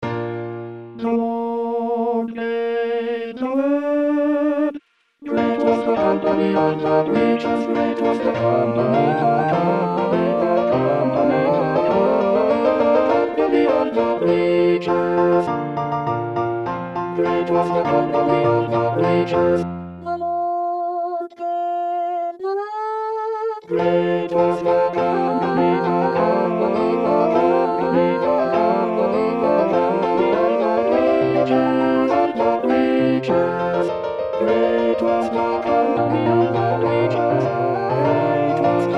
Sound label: Soprano; Messiah